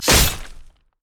basic attack claw; rengar

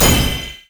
shield; LoL; lulu

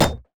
minotaur basicatk; LoL; alistar

